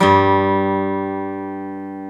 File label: GTR, Dual Guitar, Ac 12+; Club-50 - Foundations